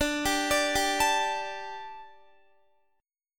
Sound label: D Suspended 4th D, down, Guitar; Dsus4; arpeggiated